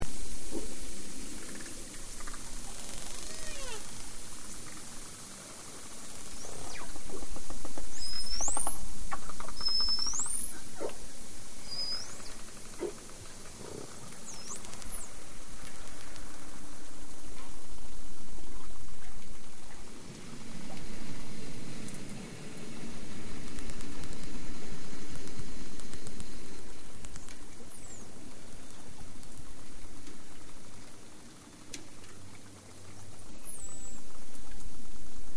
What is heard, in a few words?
Dolphin